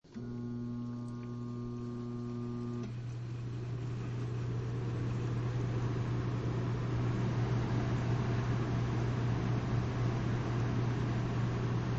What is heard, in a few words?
Air Conditioner Cycle thru speeds up; Household; Air Conditioners